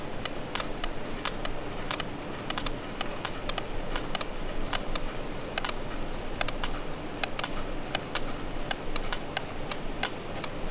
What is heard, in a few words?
P macrocephalus 3 animals short